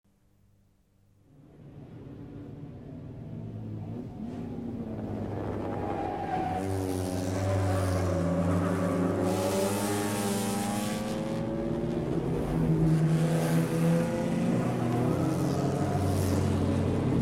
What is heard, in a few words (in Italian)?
PASSAGGIO AUTO DA CORSA